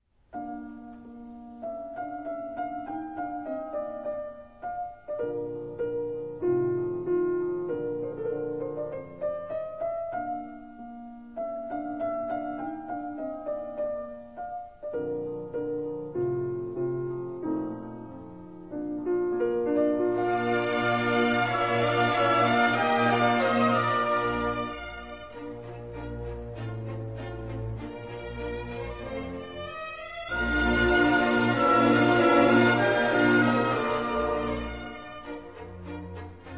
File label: Mozart Piano Concerto D minor romance